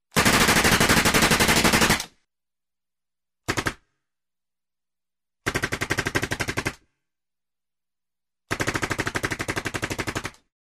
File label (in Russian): strelba avtomata uzi